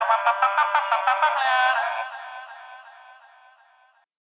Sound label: Quirky Smile, phonetones, Motorola